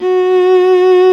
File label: F#3 solo viola; InVision Interactive; Lightware VOL-1; Instruments & Percussions